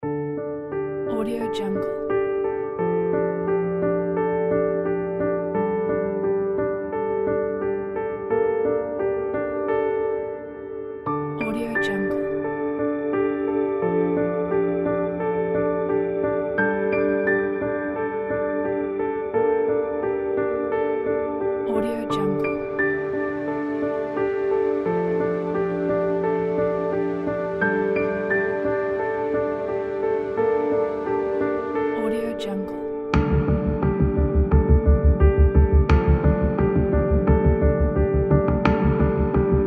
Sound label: dark piano